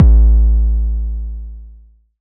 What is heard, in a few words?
KICK